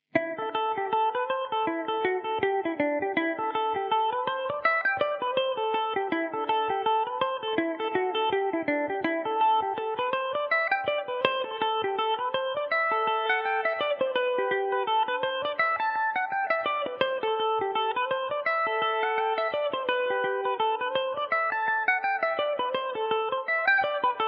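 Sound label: A dorian revelation, Irish mandolin; guns of the magnificent seven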